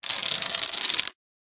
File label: shuffle, dominos